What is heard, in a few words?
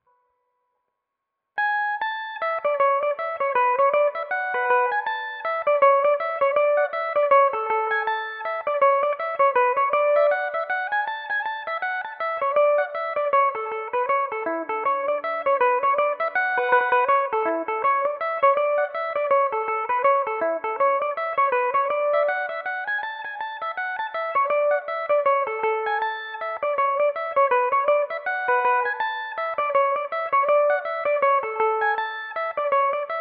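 A major, irish mandolin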